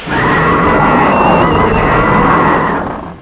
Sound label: Roar